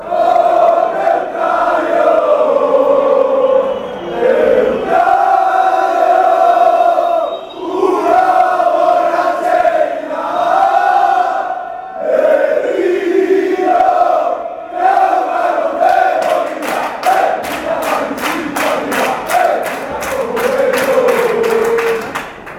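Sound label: celta celta, football chants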